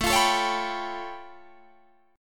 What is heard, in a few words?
A Augmented 9th A Guitar Open D